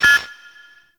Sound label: Interior Orb Station Console Count; Warframe; Tau; Orbital Station